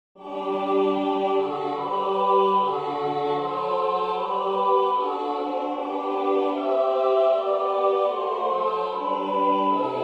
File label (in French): hymne national allemand; haydn joseph